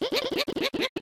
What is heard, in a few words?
Chuckle; lethal company; ambience; windows